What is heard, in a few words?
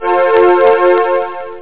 cafe; da capo vol1; synth